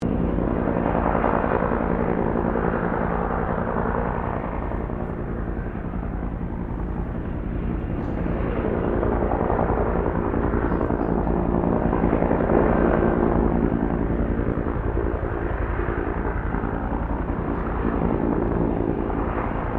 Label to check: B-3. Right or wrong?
Wrong